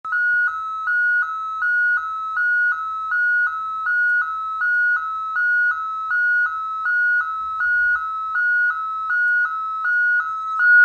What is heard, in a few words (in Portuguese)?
sirene alta potencia